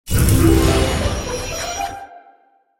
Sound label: Symbol High Pay; fire Wizard; Axial